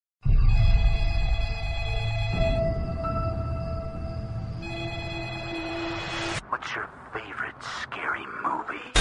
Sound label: Scream Sms